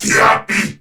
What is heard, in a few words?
Surprised; Warframe; Battle; CorpusCrewmen; CrewmanC